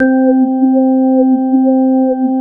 ST ORG X C4, ORGANS, House Side Of 2 Step; USB Soundscan vol.51; AKAI

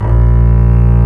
BASS A#0, SoloStringCombo; E-MU Producer Series Vol. 2; More Studio Essentials; Composer

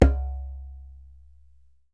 DJEMBE, African Perc

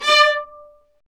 String Sections, Violas Marcato; Roland LCDP13; STR Vas1 Marcato

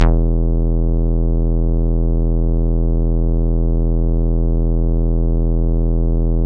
bass, synths

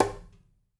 DUMBEK, ETHNIC DRUMS; Spectrasonics; Liquid Grooves 1 & 2

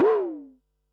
Perc, Percussion and FX; Pearl SC-40

